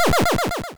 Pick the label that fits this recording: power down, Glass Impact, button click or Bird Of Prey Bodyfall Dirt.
power down